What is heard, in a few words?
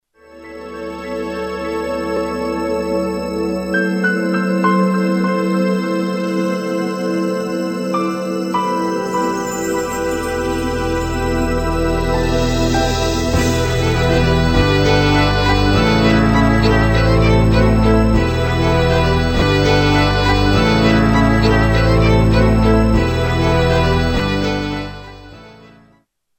Nokia Pure